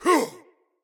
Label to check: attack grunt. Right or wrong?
Right